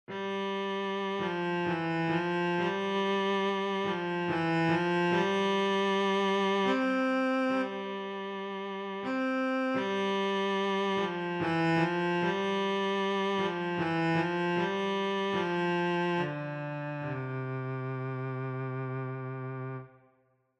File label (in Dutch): Cello; Toontje Hoger